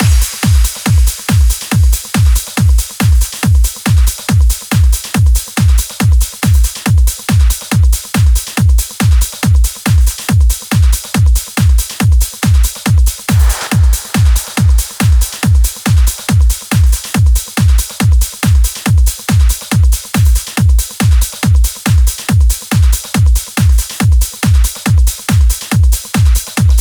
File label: Drum Mix 140BPM 16 bars; Drums & Perc; Vengeance Samples; D minor